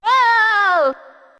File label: toad oh oh oooh